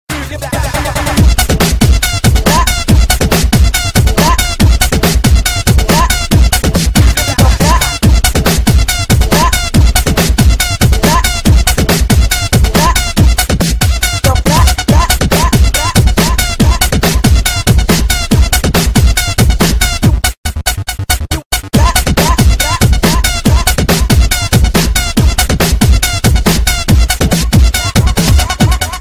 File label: Breakbeat